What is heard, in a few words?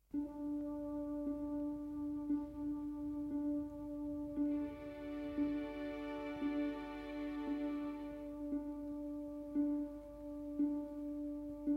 danse macabre orchestra